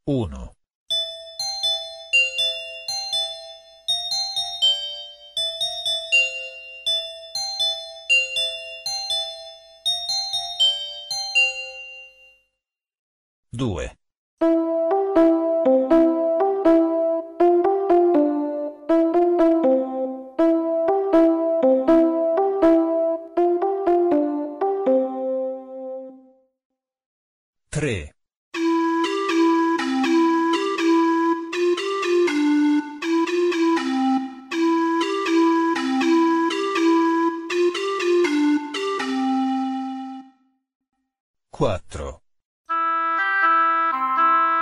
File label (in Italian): esercizio melodico